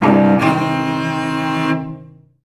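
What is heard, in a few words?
cellos C2; Best Service; Peter Siedlaczek's Advanced Orchestra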